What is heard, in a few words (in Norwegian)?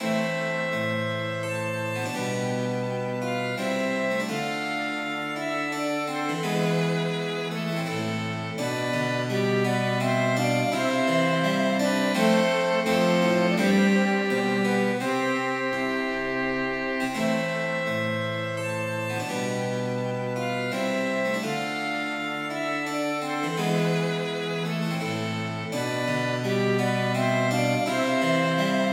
Saraband for fløyte, fiolin, cello og cembalo